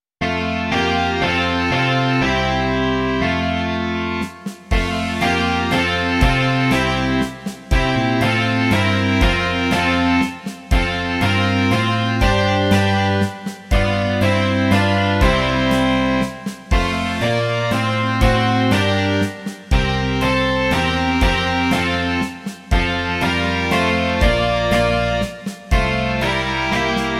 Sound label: rock